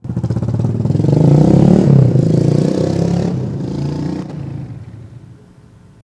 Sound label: drive by